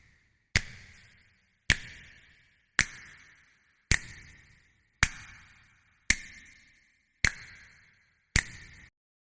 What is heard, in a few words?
drums; accompaniment extraction